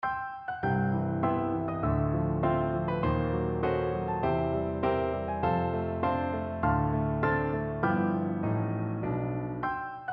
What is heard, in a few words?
pinquin dance, piano duet